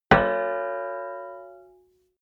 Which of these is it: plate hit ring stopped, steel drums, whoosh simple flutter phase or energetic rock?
plate hit ring stopped